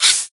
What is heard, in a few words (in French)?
Boss Bouche Ouverture